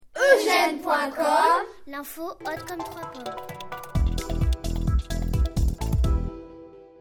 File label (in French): Jingle; version definitive haut comme trois pommes